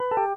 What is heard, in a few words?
voice act off; TeamTalk; teamtalk-v5.15-ubuntu22-x86 64